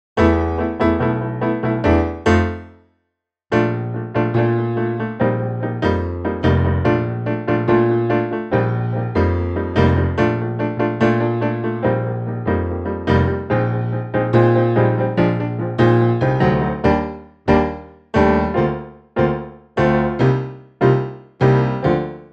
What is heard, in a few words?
Backing Track